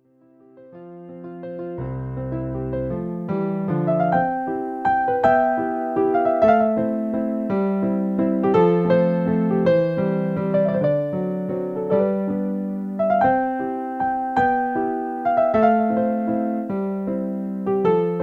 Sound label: Piano